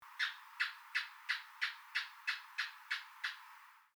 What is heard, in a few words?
ANIMAL GECKO ASIAN HOUSE GECKO CALL